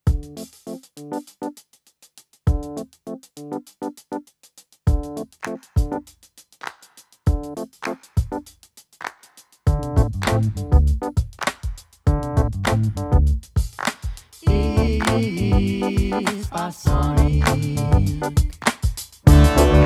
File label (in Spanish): Master aumento de la reverb